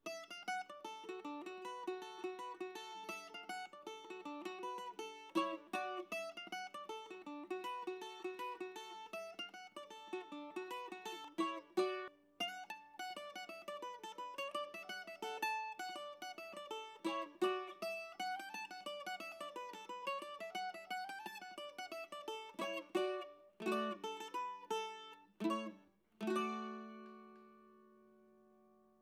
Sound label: chicken music